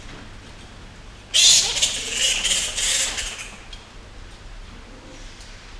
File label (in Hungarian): indiai repülőkutya; nyiregyhazi zoo 2010